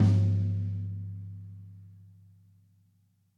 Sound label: Tom High, acoustic kit